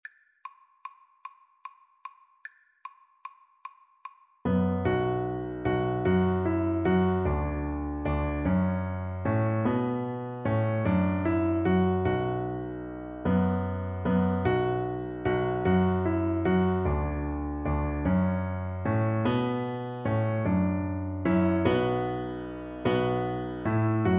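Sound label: VLC karaoke, Cello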